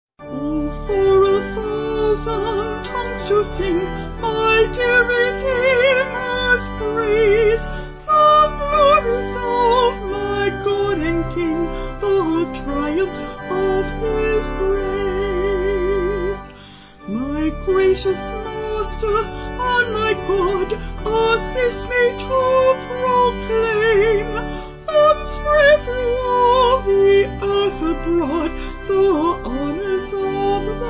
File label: Vocal Organ